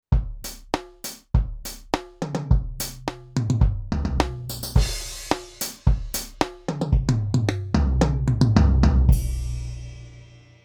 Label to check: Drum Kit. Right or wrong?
Right